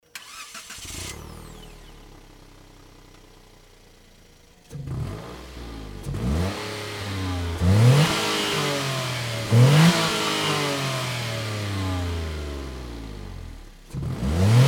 ha36s alto normal

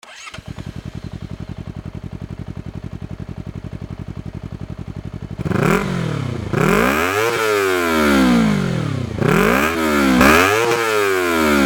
cbr250rr lci

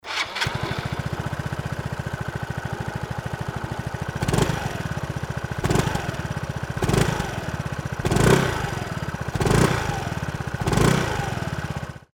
cygnus x normal

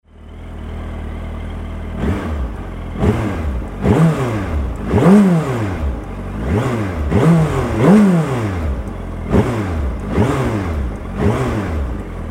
hayabusa normal

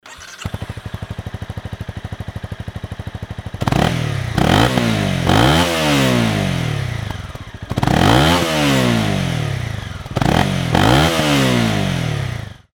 cb250r normal; mc52

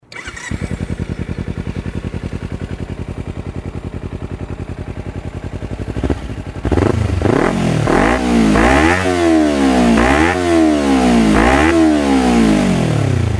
ninja250 idling

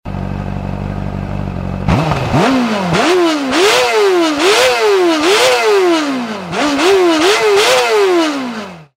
cbr600rr normal

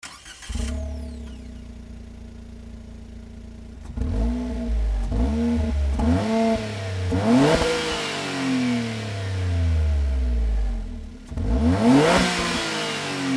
alto works ha36s fujitsubo

